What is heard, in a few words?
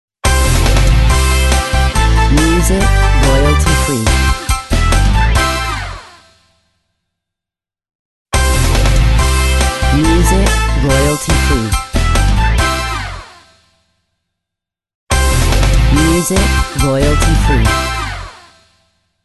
Radio logo